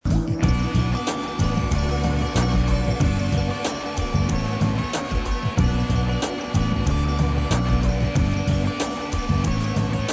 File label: Chords